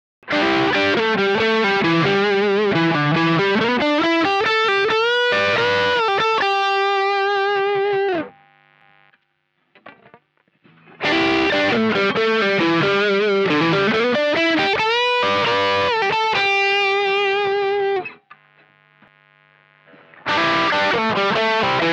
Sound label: P90 Overdrive Lead